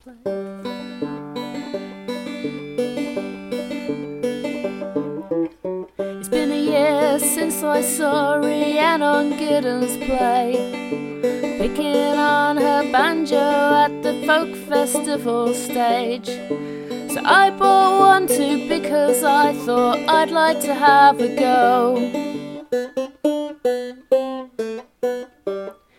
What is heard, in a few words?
Banjo